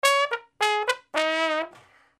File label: brass loop